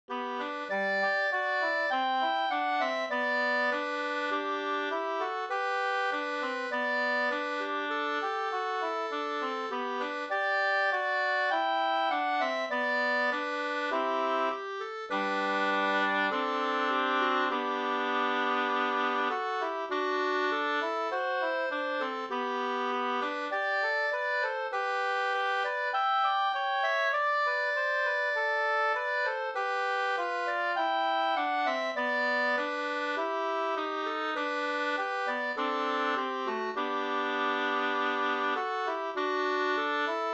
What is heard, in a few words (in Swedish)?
vismelodi